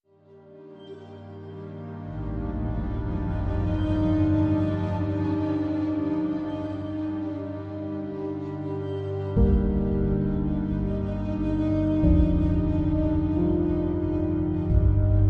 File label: Dark Sombre Drone